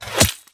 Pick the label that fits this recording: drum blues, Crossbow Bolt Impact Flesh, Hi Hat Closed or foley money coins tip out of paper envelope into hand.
Crossbow Bolt Impact Flesh